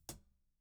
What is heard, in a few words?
HIHAT TOP, ELECTRO KIT